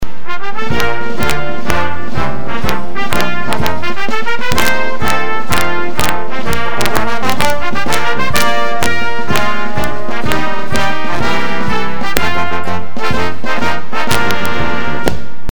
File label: trombones